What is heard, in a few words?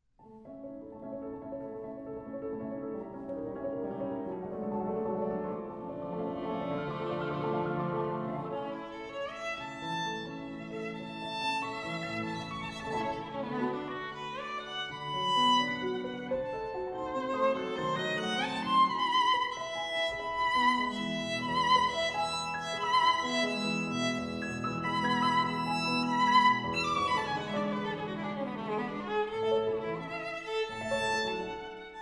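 violin sonata; gade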